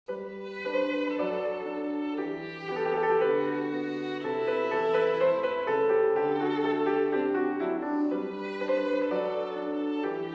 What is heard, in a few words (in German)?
Violinsonate G-Dur - Tema, Andantino Cantabile